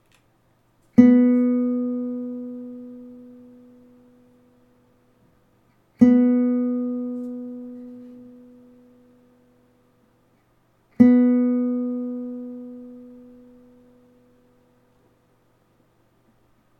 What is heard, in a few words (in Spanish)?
Sonido de guitarra española cuerda 2 Si B; afinar una guitarra